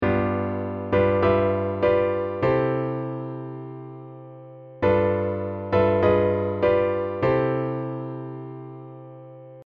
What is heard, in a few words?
Tension Resolve